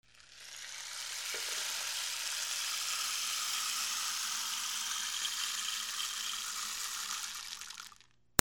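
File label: percussion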